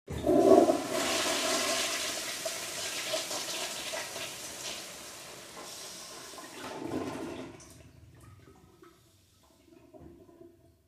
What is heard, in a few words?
Public Toilet Flush.